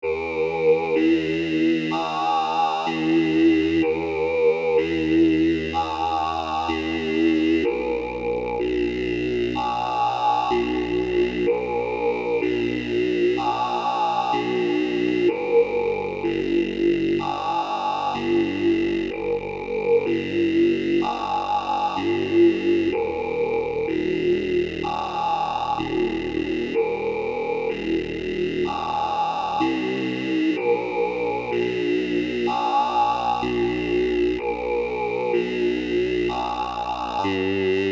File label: String Filter Voc; tellun